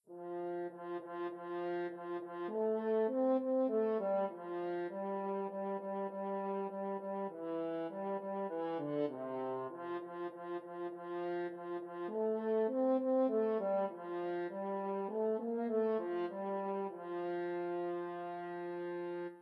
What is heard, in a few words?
10 little indians, french horn